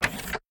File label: gate; factorio; Factorio 0.14.21